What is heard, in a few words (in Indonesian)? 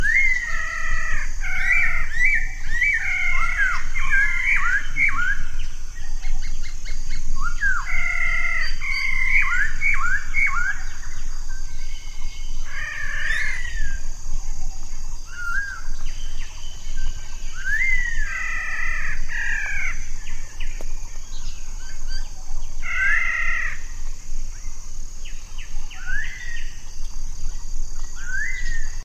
suara poksay punggung merah